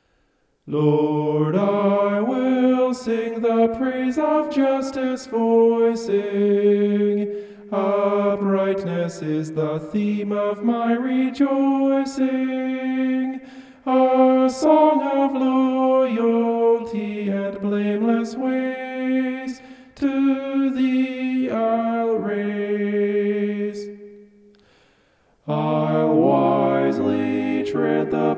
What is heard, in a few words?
vocal homoph; psalm 101